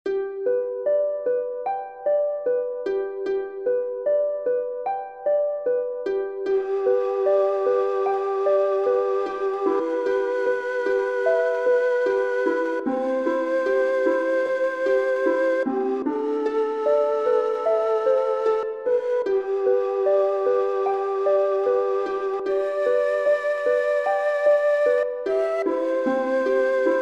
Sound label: Bpm 75